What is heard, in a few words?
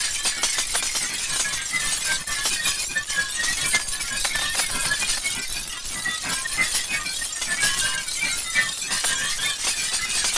electronic interlude